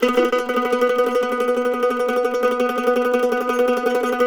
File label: MANDO TREM B3, Whole Lotta Country; Sampleheads; Whole Lotta Country 1 & 2